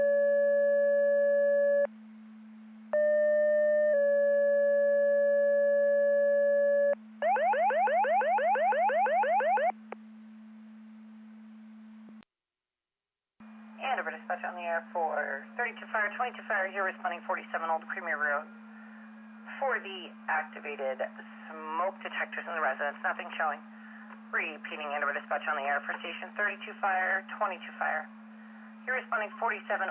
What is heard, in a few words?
Siren; Andover Twp Boro